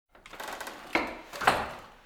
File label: Antique Loom In Use Weaving; Electa Quinney